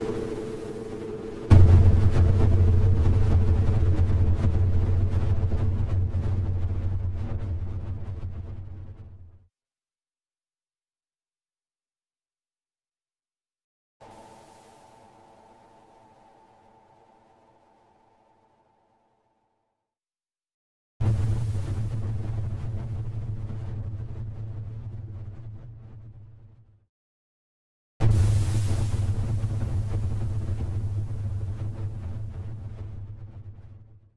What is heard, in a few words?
thunder